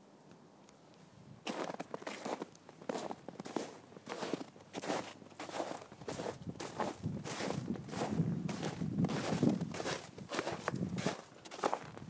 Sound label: snowsteps